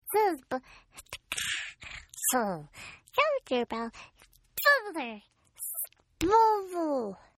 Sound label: Baby Gibberish; Talking; Sound Effects:Voice; Partners In Rhyme